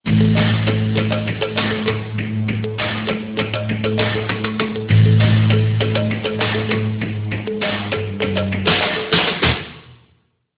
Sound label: ringtone scary techno